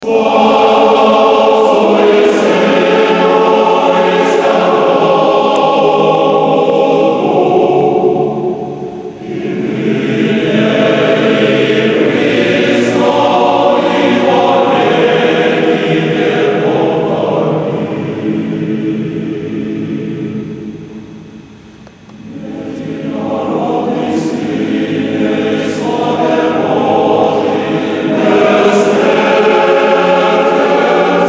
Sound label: male choir, edinorodnyj syne; male choir of mephi